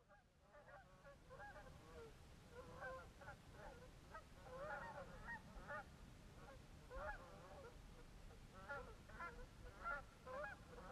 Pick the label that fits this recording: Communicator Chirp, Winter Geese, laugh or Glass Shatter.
Winter Geese